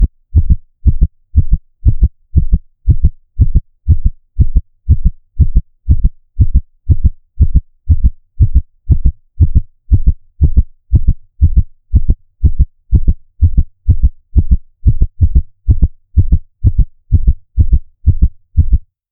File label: a shy heart pulsing fast, heartbeat